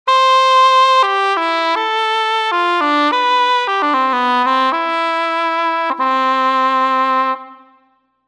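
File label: English Horn; TURBO VL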